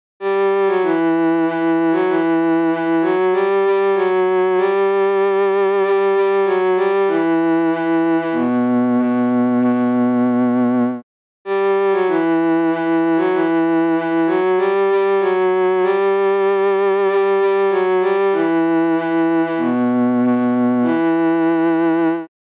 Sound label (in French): sans voix solos